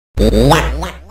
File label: noise